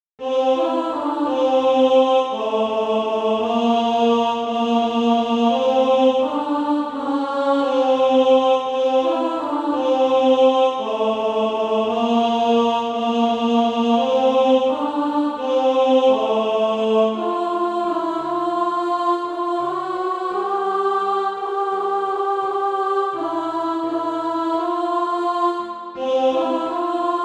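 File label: alto, Practice Music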